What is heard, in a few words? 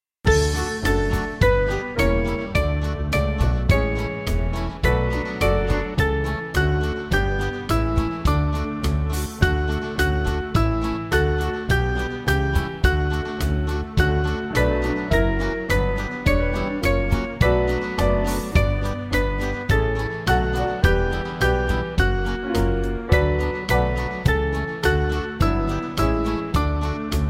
Band, church music